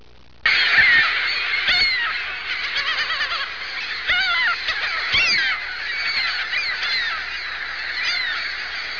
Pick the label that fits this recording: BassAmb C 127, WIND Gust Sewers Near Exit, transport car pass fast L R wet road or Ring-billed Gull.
Ring-billed Gull